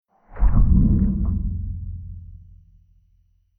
Deep Sludgy Liquid Impact Stinger Long Reverb Decay; Tom Hutchings